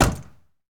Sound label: Mustang GT350 Door Close; Automobiles